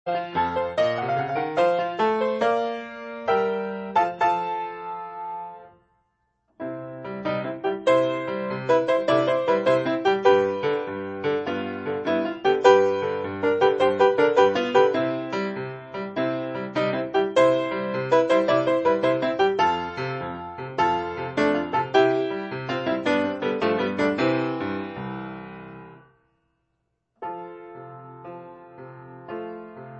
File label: Pitfall II medley (grand piano)